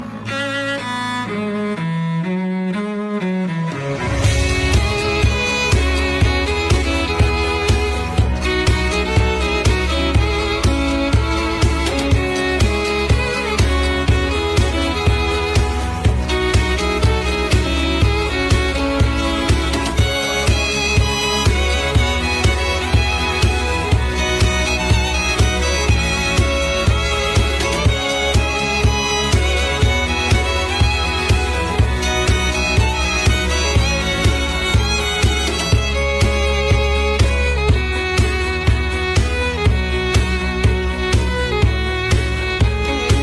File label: Violin